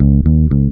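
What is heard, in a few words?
bell, Roots Disco; USB Soundscan vol.32; AKAI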